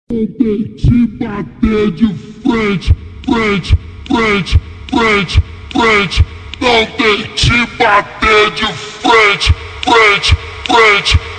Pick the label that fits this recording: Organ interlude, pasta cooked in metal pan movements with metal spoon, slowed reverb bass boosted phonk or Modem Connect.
slowed reverb bass boosted phonk